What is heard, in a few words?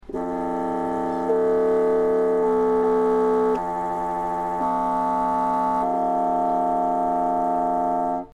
resonkeys